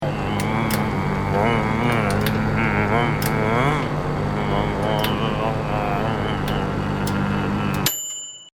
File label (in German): Mikrowelle; eventphone; rc3 world